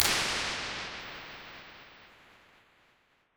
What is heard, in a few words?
modified rir long